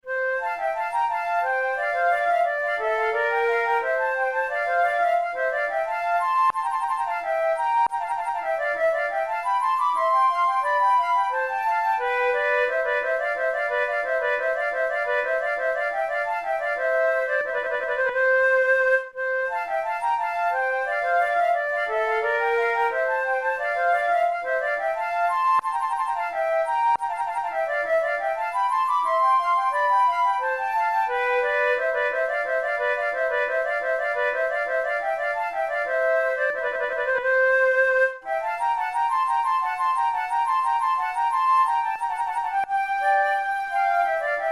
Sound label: flute sonata allemande; mattheson